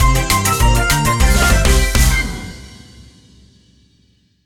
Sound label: jingles Level Complete; Toki Tori Collection